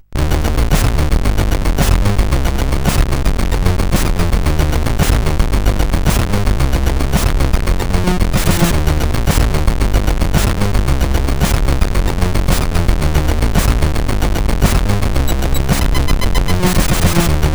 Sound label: Spook tune CSG8580R5; Spook tune 2; hvsc